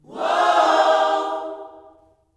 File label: WHOA-OHS, Vocal Gospel